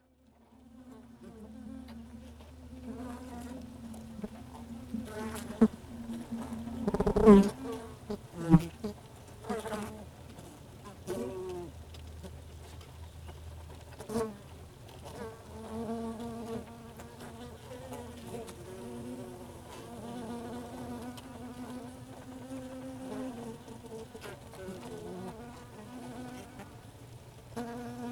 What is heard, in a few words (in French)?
Ruche Interieur; Nature